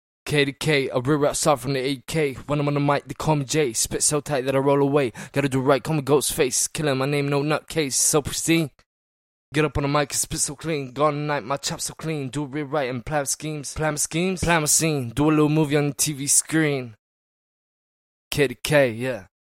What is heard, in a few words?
Fast Rap HH DM 82, apellas